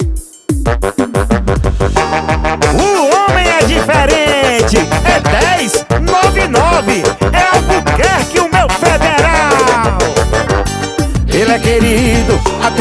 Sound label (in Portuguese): jingle piseiro